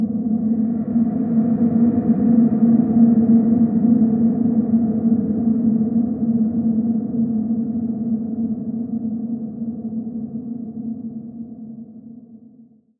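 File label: buzzing sound of flying object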